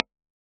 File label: VX AC30 2x12 NRM (Alnico Silver) R121 SM545